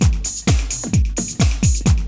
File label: house, loops